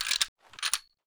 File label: m14 check, weapons